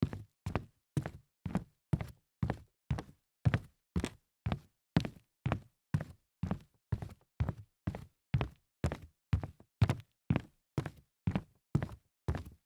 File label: sneaker fast walk hollow wood